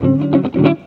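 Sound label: JAZZ GUIT GROOVE; Best Service; World Colours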